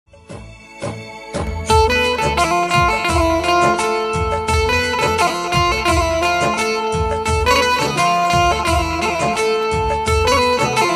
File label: banjo ringtone banjo music ringtone; mom dad ringtone